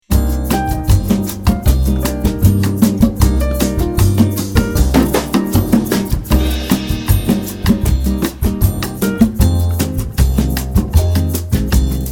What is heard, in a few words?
key of Eb; note 6 C; jazz workout